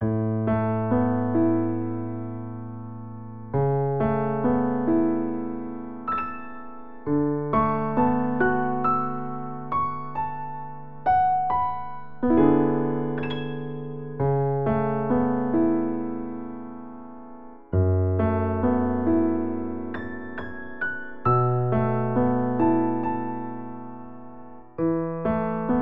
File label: Piano; Animal Crossing New Horizons